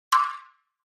Flash Beep Hollow Tap

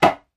Toilet: Seat Up / Down.

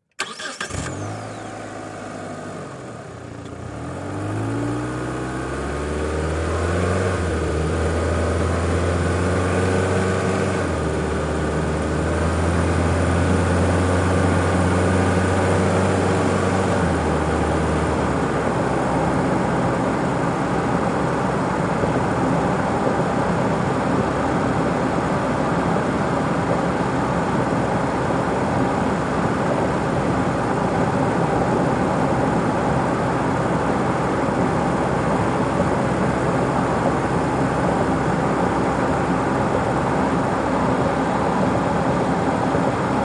Sedan Accel 60mph St

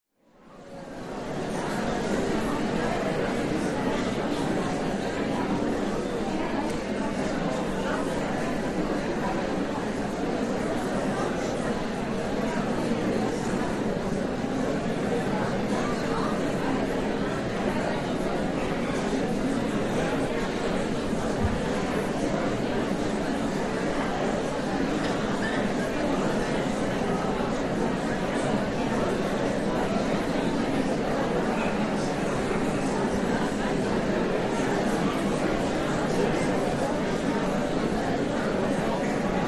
Audience Lrg Hall Hum A, crowds int

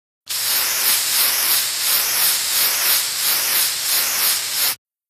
Aerosol spray various, aerosol can; FOLEY PROPS AEROSOL CAN: INT: Aerosol spray various.

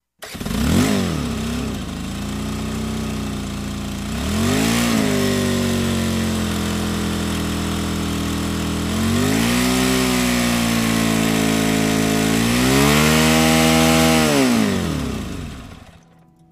Chainsaw Start, Idle, Big Rev For Slice

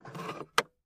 ashtray

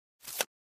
Pop Up Rattle Tap

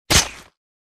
Sword Or Bayonet Juic, stab

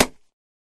Boxing Glove Hit Slap

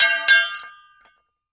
Bracket Drop On Cemen

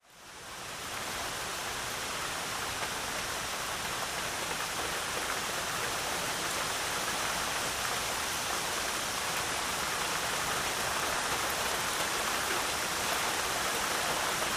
rain hard

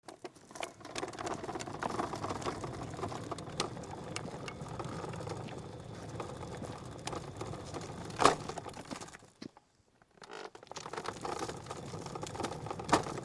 Ambulance Rolling Gu, gurneys

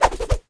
Air Swish Quadruple

